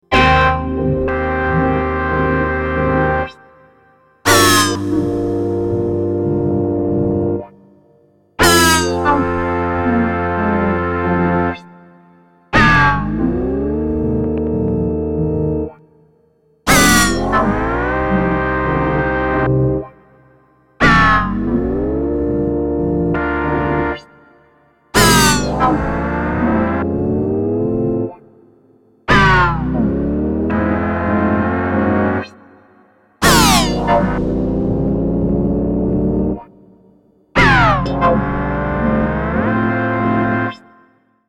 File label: Motor Synth MkII Acceleration Break; Gamechanger Audio